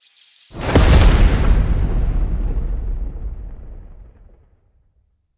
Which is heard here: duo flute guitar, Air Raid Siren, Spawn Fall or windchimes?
Spawn Fall